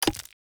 ui click menu

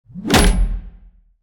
morgenstern hit; player

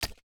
ui click

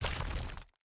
block destruction stone

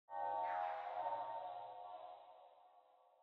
ambience room necromastery magic layer

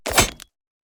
skeleton strike; Shelter

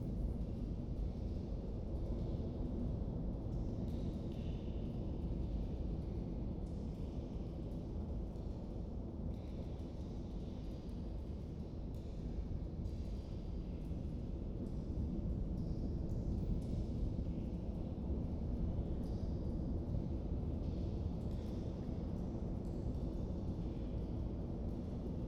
ambience main ambience close

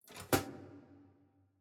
ambience room bucket training

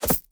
object grab moneybag

